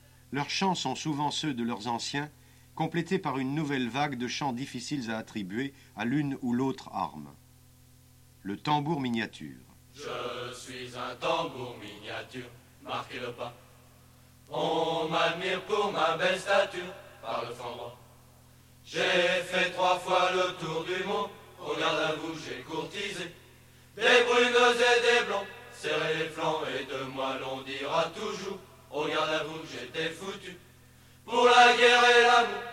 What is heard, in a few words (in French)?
Le tambour miniature; Troupes de marine